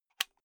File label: unplug safety plug soft